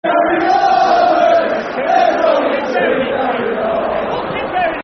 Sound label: Two Gary Roberts, Football Chant (Soccer Songs); Crewe Alexandra Cafc